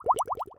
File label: Bubble